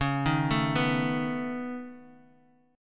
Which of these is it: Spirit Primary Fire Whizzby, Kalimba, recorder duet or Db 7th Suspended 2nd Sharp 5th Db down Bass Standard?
Db 7th Suspended 2nd Sharp 5th Db down Bass Standard